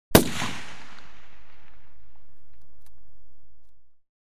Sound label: Warfare, Sniper, Single Shot; BBC Sound Effects Library; Explosions Guns Alarms